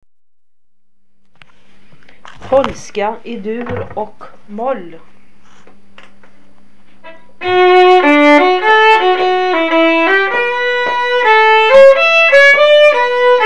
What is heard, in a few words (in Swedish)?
Polska i dur och moll